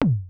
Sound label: Bump